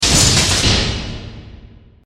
Mechanical Stamp, Mechanical, Stamp, Press; 5.1; Sound Effects:Science Fiction; BlastWave

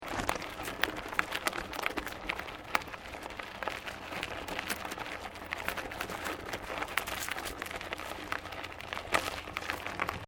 Bicycle Mountain Bike Dirt Peddalling Loop; Bike near Tazawako Japan; Bicycles (Sound Effects:Vehicles)

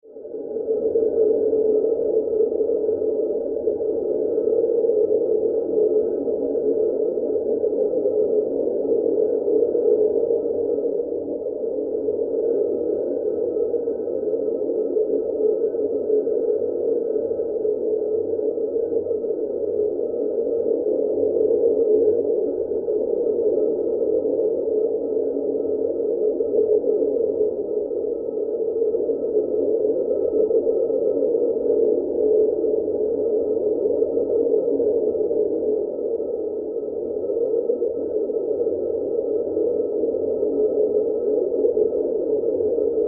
Science Fiction Planet Atmosphere Hollow Wind; Sci-Fi

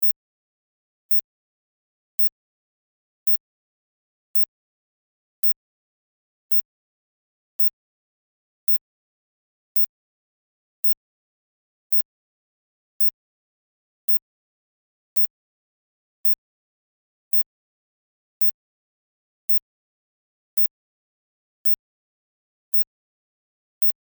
Science Fiction Computer Beeps Loop

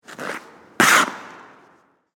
Ice Skates Jump; Sports; Land, Skid, Indoor, Ice, Rink; BlastWave

